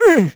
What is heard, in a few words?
squish; Crypt of the NecroDancer